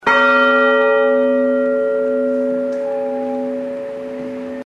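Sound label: 929.5Hz, bell; cccbr; Milton Abbey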